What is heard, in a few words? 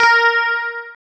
GUI, E-Guitar